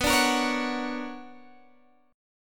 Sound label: B Minor Major 9th